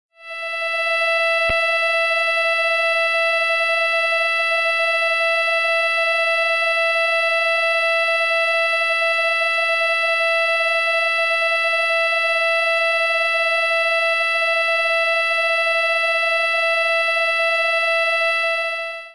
violin E

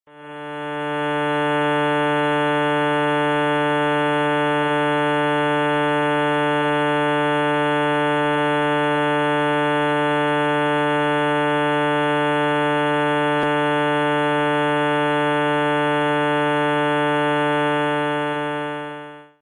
cello D 2nd

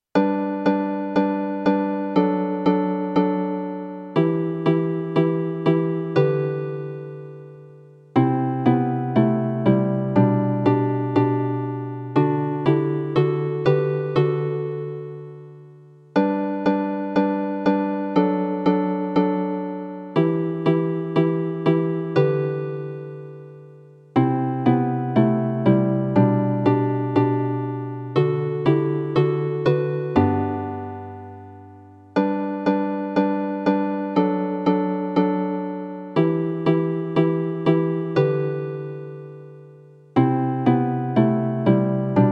Lever Harp; JOLLY OLD SAINT NICHOLAS

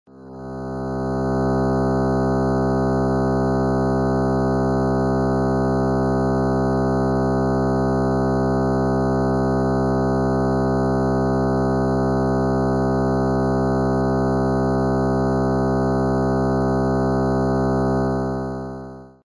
cello C 4th

